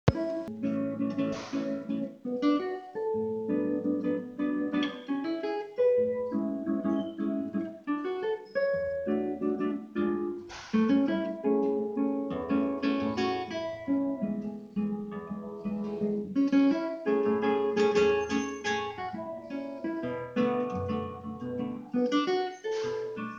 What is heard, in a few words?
samba, classical guitar